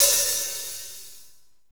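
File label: hi-hat, Techno Rock; Northstar; Drumscapes Roland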